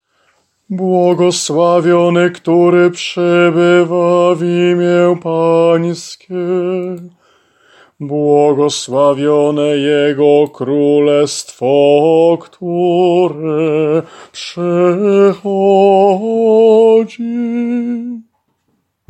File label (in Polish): Aklamacja, ALT; Niedziela